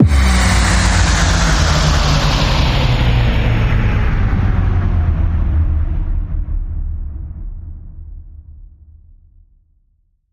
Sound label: Slow Burn Downer